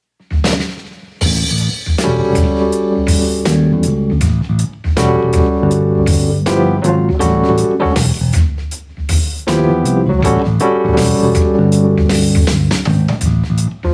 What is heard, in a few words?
A blues backing